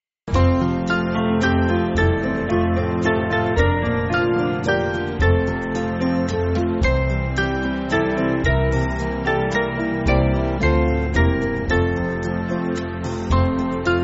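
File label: Band, Music Backing Files for Churches; Kirkpatrick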